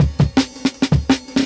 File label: Jungle Breaks